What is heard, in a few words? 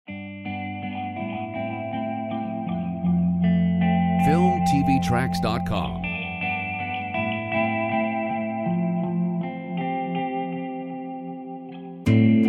Ambient energy; FILMTV-TRACKS